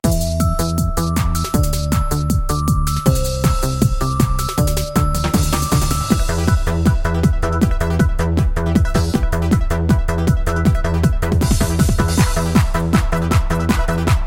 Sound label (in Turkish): BK5 dik horon